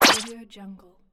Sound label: booster